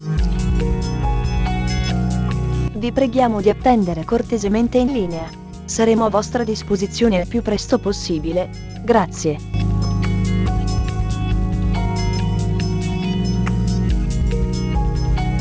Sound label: Ambient Ita